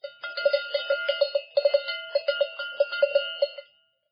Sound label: town bell